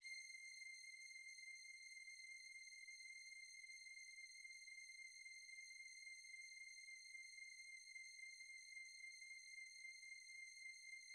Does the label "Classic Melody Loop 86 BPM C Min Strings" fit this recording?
Yes